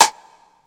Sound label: rap snare sound a sharp key